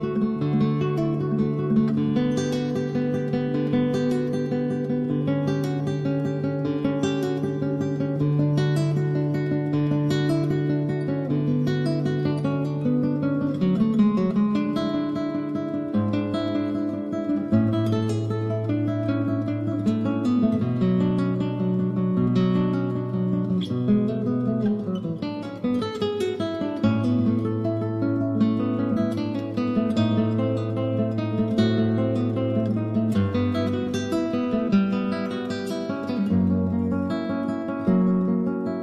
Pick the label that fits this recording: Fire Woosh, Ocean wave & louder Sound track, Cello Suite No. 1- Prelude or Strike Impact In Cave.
Cello Suite No. 1- Prelude